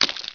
flesh drop; heretic ii demo

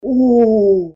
OOO; santas gift jump

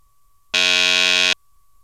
error buzz; toawdemo

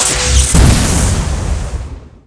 RAILGUN; Shadow Master

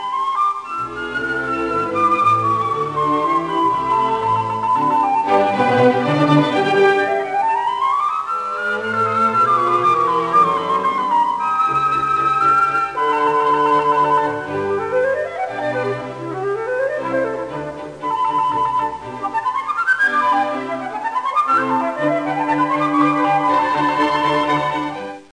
flut con

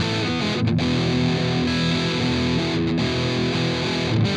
Cracked Cone, Guitars; ACID MUSIC

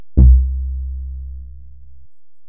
Bass

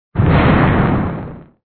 Flame; mardek rpg

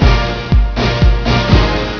bonus

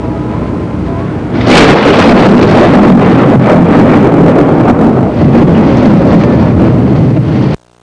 thunder, Nature; Sound Library 2000; Wayzata Technology

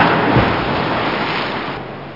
plof

